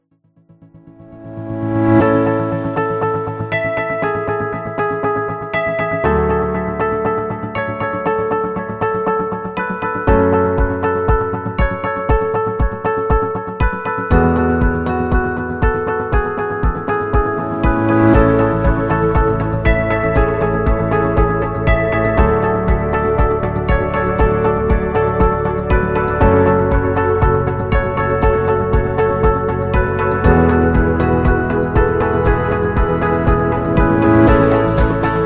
This Is Corporate; corporate music vol 7

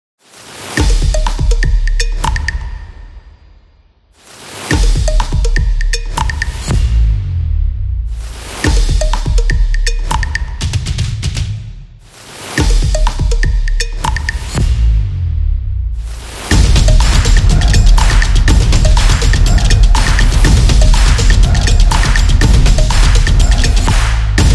Intro Drum

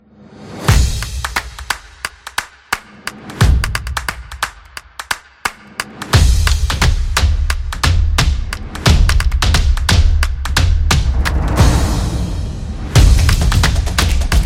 The Drums Dynamic Solo, stomps and claps

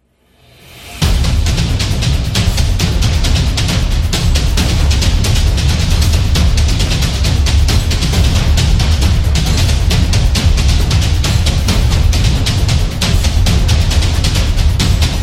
Drum Epic

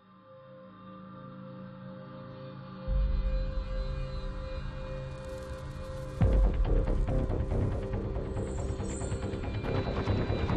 Horror Running

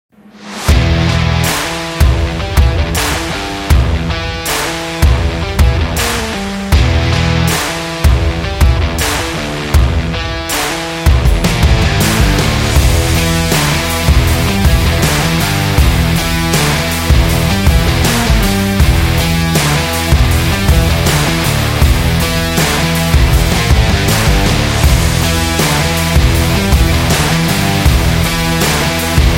An Indie Rock

